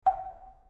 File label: default hit sound, wooden fish